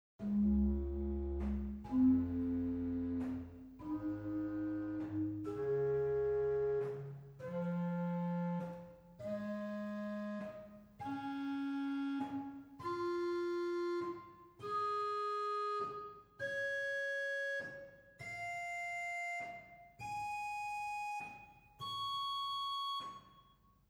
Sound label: Quintadena 8 arp, organ stops; Reinhardtsgrimma